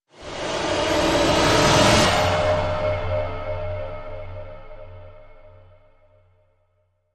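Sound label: Crescendo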